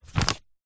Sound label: paper sheet a4 grab pick up; zapsplat